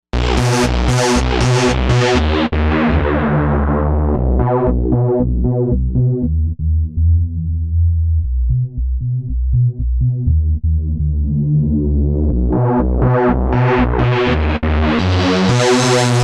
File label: Fury Bass; Novation UltraNova; Factory Patches